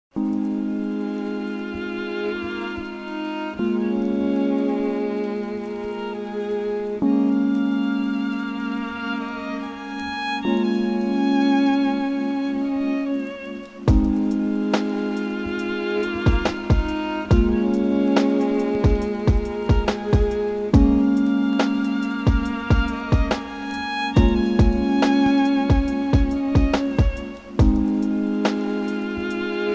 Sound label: Lo-Fi Chill Violin Rain